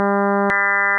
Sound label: dark tone bright tone